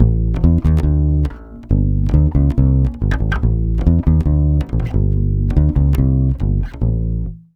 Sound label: BASS GROOVE, Brazil Bossa; USB Soundscan vol.16